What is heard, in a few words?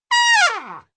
jazzy trumpet blast